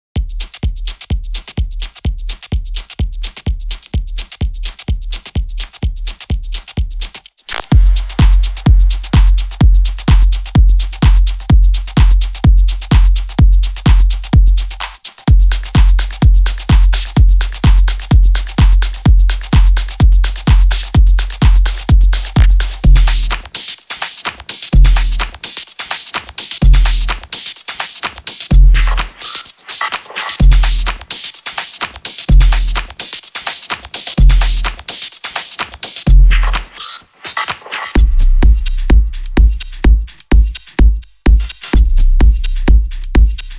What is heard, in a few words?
Hats Tops